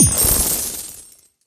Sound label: bonus; solitaire haven